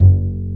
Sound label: WOODB. F#1; Analogon